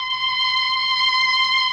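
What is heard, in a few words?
MELLOSTR, Mellotron Violins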